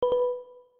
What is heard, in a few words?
Battery caution; Alcatel OT-903